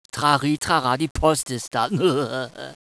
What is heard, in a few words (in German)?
Posteingangsbenachrichtigung; Win-Sounds